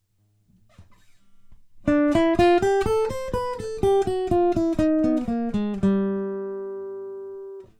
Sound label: original guitar line